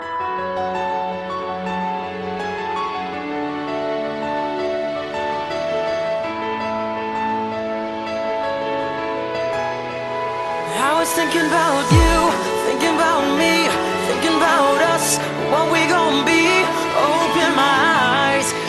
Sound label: Lovely Tune